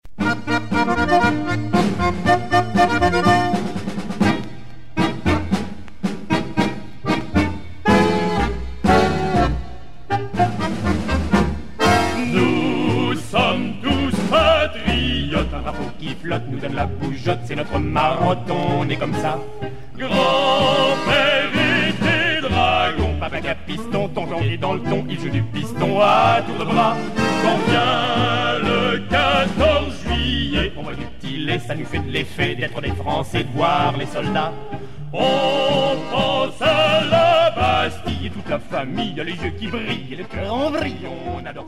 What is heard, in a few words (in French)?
tango militaire